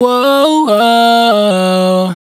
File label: riff Woah Run Tuned F#, Dry Vocals; Raw Vocals; Slate Digital Omnivox Vocal Sample Pack